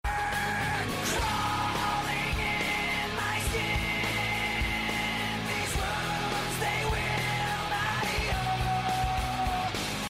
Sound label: craaaawwwll; when someone is whining